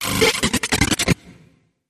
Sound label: Short Hollow Stutter